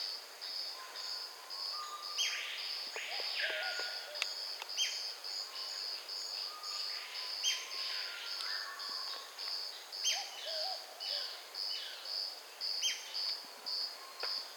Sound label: White crested spadebill; پرنده بیلچه نوک کاکل سفید